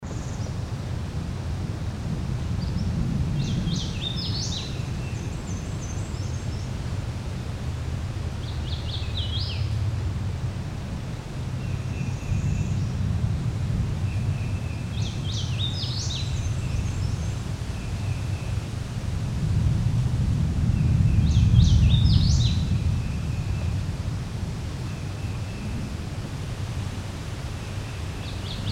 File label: Hooded Warbler; charles md